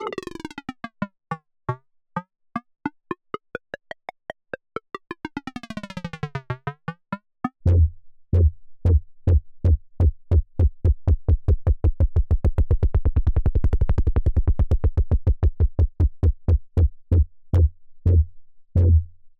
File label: Unprocessed Synths